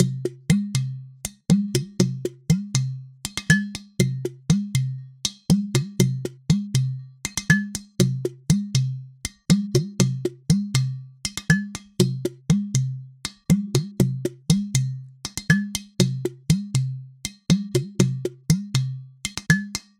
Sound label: Udu (Ibo) & Cajon Drums